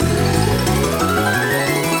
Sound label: turbo anticipation; Xing Yun Jin Chan